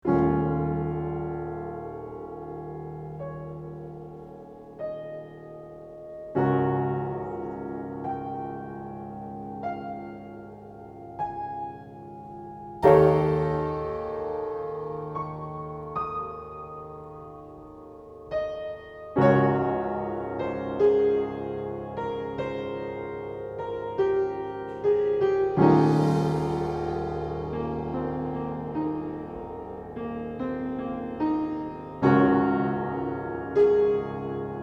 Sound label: piano trials